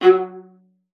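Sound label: F#5, viola spiccato; Xsample VOL-5; 90 s Sample CDs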